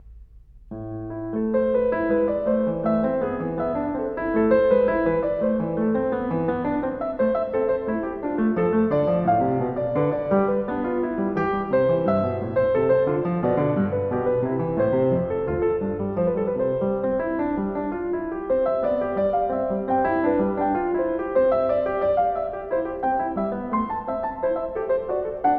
Einstimmige Inventionen a-Moll, klavier; Einstimmige Inventionen XIII) a-Moll; BWV 784; BACH; Inventions

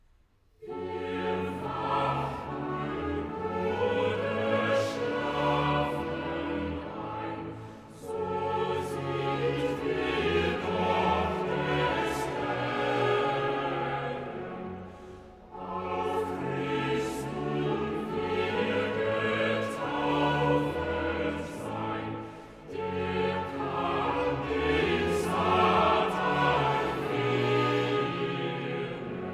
Choral, cantatas; BACH VOCAL; BWV 114; Ach, lieben Christen; Wir wachen oder schlafen ein

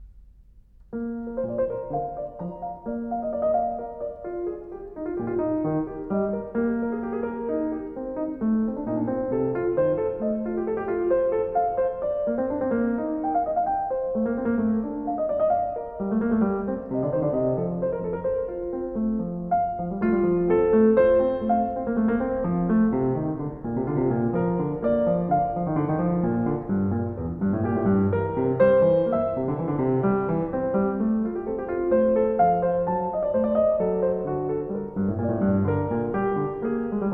Einstimmige Inventionen B-Dur, klavier; Einstimmige Inventionen XIV) B-Dur; BWV 785; BACH; Inventions

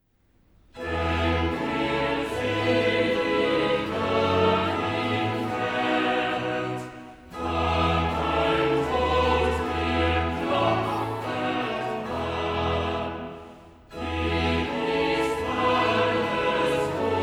Choral, cantatas; Denn Wer Selig Dahin Fahret; Bach; BWV 146

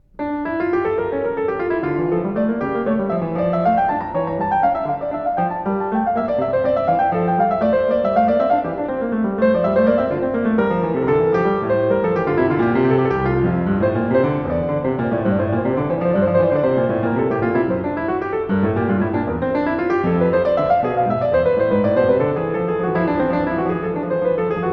Einstimmige Inventionen d-Moll, klavier; Inventions; BWV 775

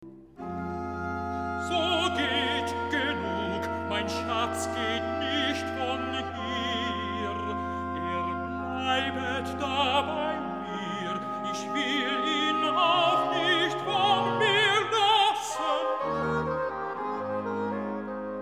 Recitativo Tenore So geht! Genug, mein Schatz geht nicht von hier; BACH; Christmas Oratorio

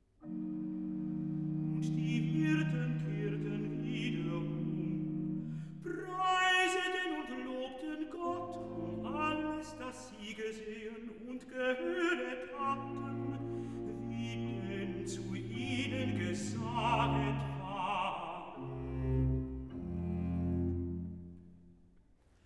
Und die Hirten kehrten wieder um (Recitative); BACH; Christmas Oratorio

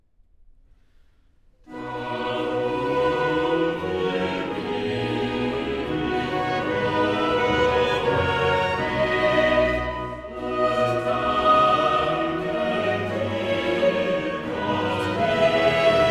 Choral, cantatas; BACH VOCAL; BWV 130; Herr Gott, dich loben alle wir; Darum wir billig loben dich

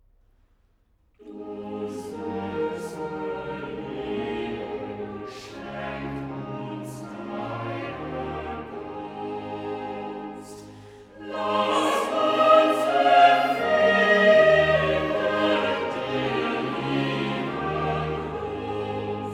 Choral, cantatas; BWV 169; Gott soll allein mein Herze haben; Du suBe Liebe schenk uns diene Gunst

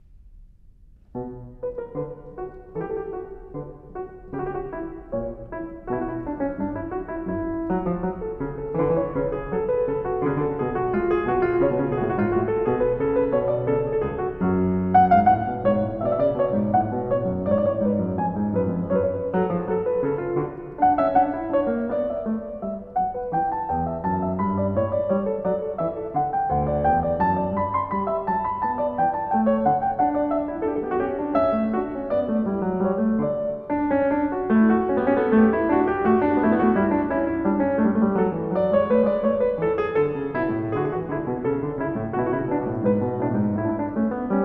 Einstimmige Inventionen h-Moll, klavier; Einstimmige Inventionen XV) h-Moll; BWV 786; BACH; Inventions

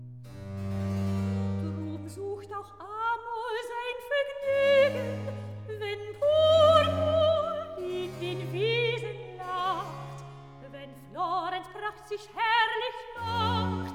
Rezitativ, cantatas; BACH; Wedding Cantatas; Drum sucht auch Armor sein Vergnügen

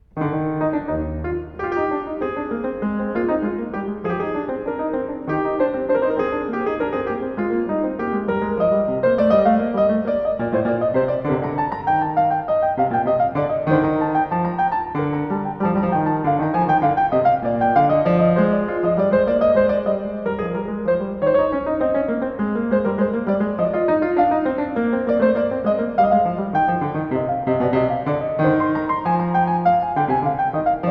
Einstimmige Inventionen Es-Dur, klavier; Inventions; BWV 776

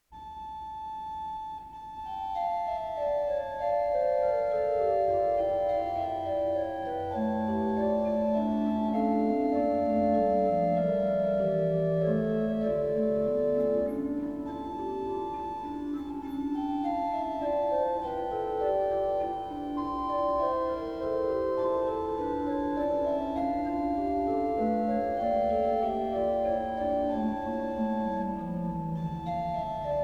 Orgel; BACH; BACH ORGAN; Organ Works (Stereo.1956-71); CHORAL PRELUDES; BWV 683; Vater unser in Himmelreich; Orgelmesse

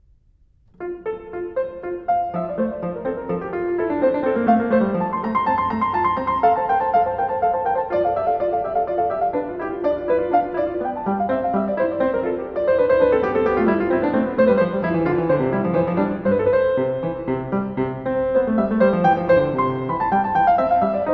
Einstimmige Inventionen F-Dur, klavier; Inventions; BWV 779

